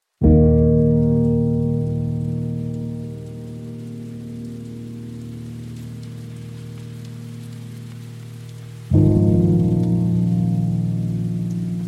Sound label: ambient